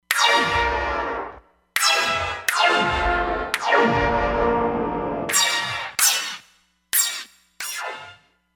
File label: Smear Hit